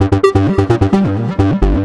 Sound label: Bassline